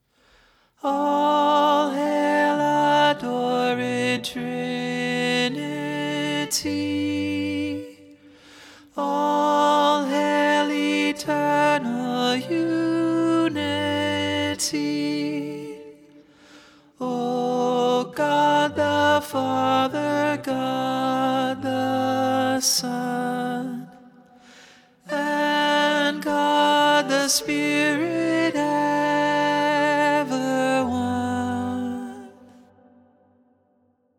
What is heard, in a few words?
Alto Prominent, vocal